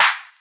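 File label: classic hip hop snare; Classic Hip Hop Mega Kit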